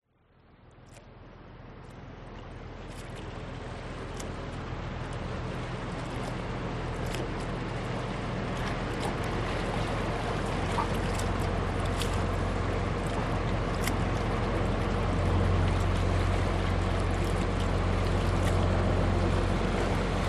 vehicles boat motor small fishing pass by leave harbour waves against rocks